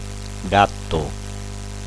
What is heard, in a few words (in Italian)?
gatto